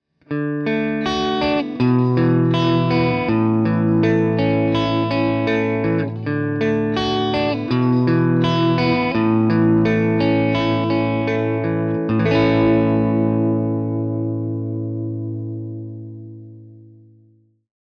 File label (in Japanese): アルペジオ